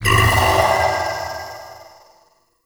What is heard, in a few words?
Idle Noble Power; Trinity